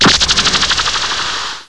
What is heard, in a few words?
Radioactive molecule; Chiral 1.0.1